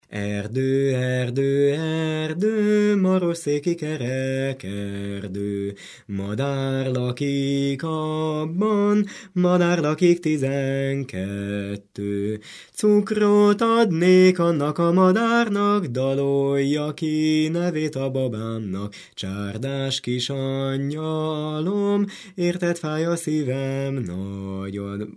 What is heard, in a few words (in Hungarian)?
nepdal; erdő erdő marosszéki